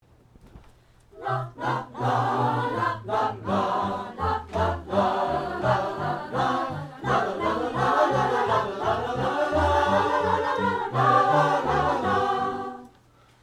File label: Gavotte, Chorus; Gavotte (from 5th French Suite); J.S. Bach, arr. Bennett Williams